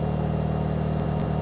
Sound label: engine, vehicle; vehicle mod